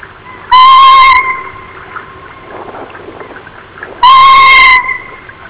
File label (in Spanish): maca grande